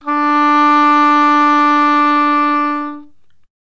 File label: english horn d4, Horns and Brass